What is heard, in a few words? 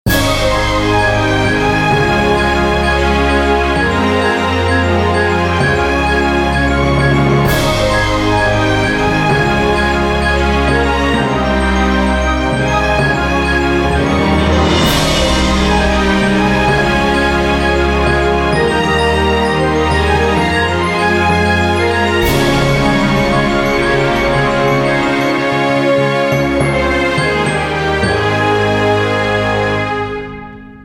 Symphonic Ring; ringtones; mobile; Cisco